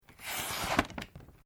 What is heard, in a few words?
Paper cut; Material components for constructing more complex sounds; Paper (Sound Effects:Materials)